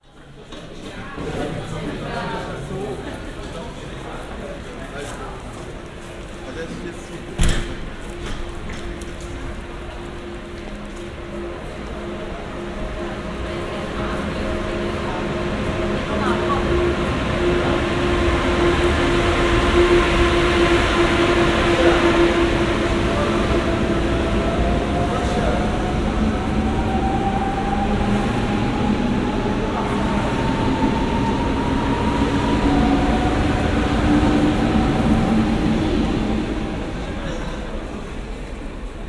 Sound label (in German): Sennheiser Ambeo Smart Headset test bahnhof